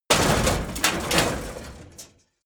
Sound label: scrap, hits impacts